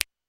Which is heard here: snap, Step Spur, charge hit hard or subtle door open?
snap